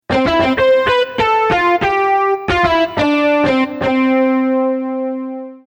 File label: Jingle Electric Guitar